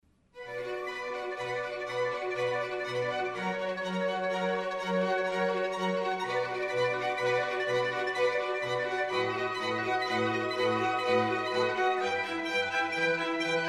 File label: Concerto for 4 violins cello strings & bc; in B minor; Concerto No 10; Antonio Vivaldi (PD)